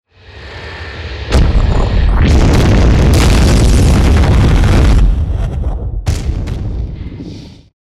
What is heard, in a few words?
Fire element spell